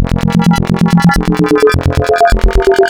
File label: Spectral, wavetables; Architecture 32